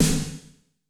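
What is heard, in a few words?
wet room snare 909, right; Masterbits - Soniq Elements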